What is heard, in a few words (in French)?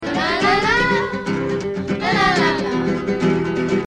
A Majeur 7